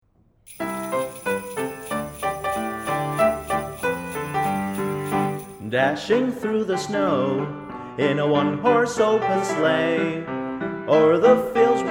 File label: jingle bells